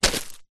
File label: footstep single boot deep small granite stones dirt dust; zapsplat; foley